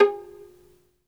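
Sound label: SOLO VIOLIN, Synclavier Strings; ILIO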